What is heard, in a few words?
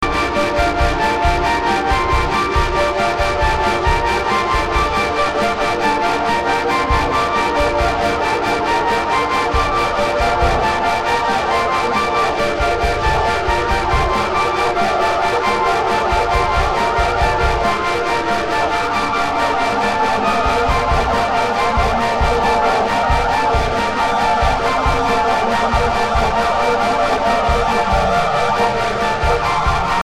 Celestral Trance